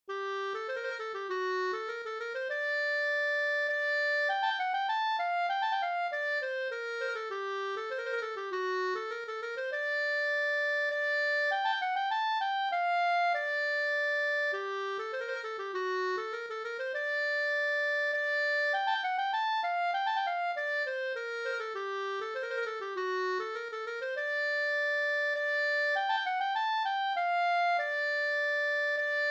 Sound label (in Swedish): polska fr Rättvik